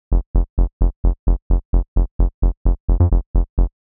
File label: techno bassline 130 bpm